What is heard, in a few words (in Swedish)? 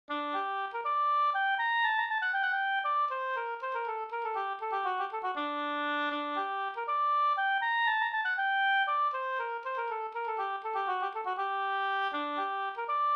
polska Bodapolska